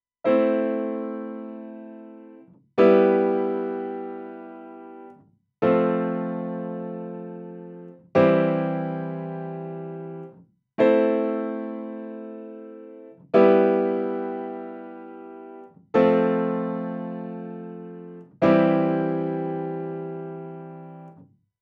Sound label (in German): Piano Pur; Apollo